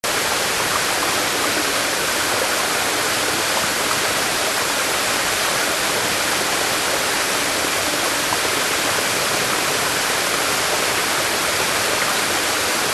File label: Falling